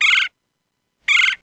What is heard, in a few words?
bird, ambient animal; Counter Strike Model Pack; CS:GO